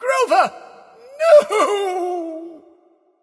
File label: death; smite; voicepack; sylvanus